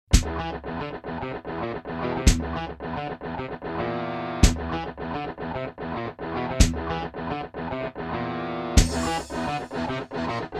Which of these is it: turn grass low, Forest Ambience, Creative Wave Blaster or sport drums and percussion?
Creative Wave Blaster